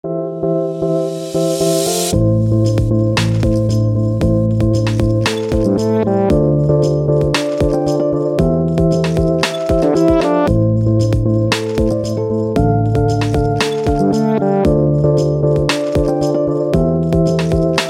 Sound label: Mystic Electronic